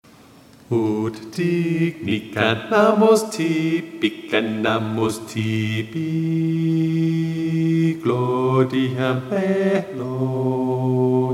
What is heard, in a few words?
Bass; Aufer a nobis pt 1